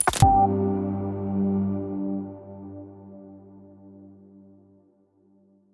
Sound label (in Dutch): nieuws pingle